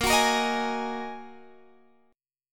A sharp 7th Suspended 4th Sharp 5th A sharp, Guitar Drop D; 20,21,20,20,21,x